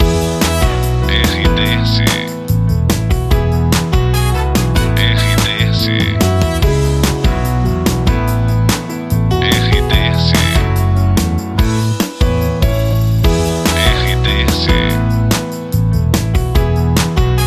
trilha para locução whatsapp; Prata FM 2021

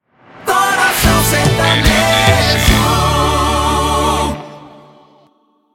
PONTE CORACAO SERTANEJO

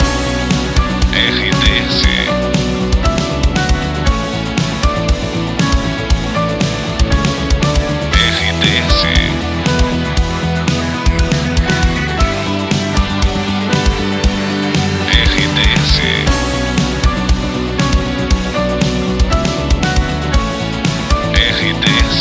trilha locução; Pacote 92 FM, Timbó/SC. Pacote com característica pop rock

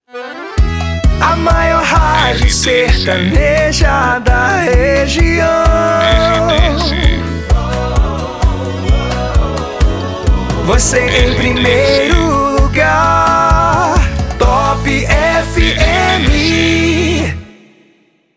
saída de bloco; Pacote Top FM, Sorocaba/SP. Emissora com característica 100% Sertaneja

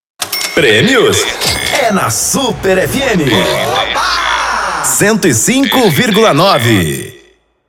intercom

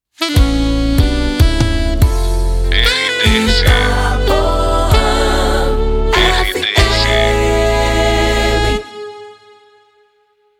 PONTE RELAX; ITAPOAN FM 2020

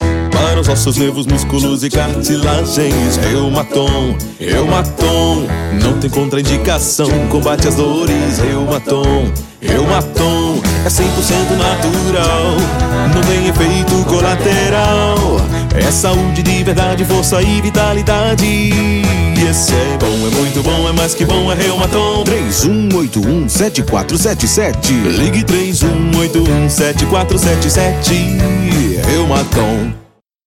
Jingles Comerciais; REUMATON PRODUTO NATURAL

